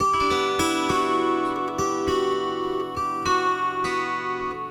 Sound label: Kantele Strum; Sonic Foundry (Sony Creative Software); Friendly Fire